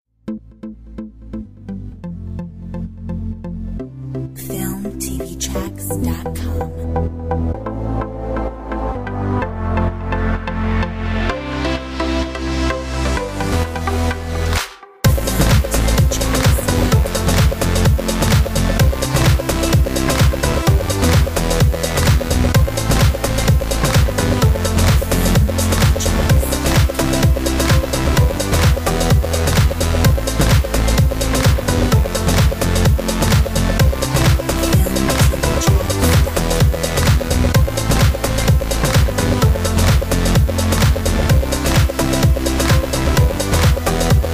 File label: Club music